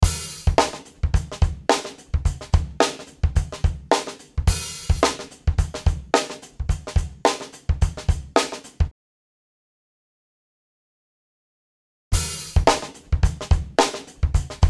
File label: drum before tube; VTC TUBE BOOSTER; TUBE INSTANCE